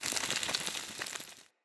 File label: Impact Burn Medium Flesh; Weapon Impacts